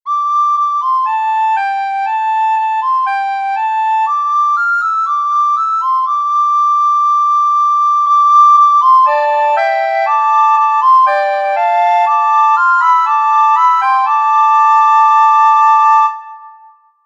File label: pentatonic; Composition by Kurt Hahn for high school. Has a smooth oriental sound and is subtitled 'Fujiyama Panorama.' Moderately demanding.